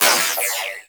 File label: Miter Alt Shot, Weapons; Warframe; Tenno